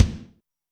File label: kick, Zoom MRT-3B